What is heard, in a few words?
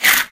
claw scrape; hit